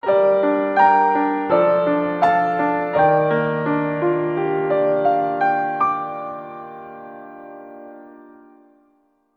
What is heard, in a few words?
Minigame Loss; Naruto: Ultimate Ninja Storm